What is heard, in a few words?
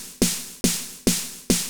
music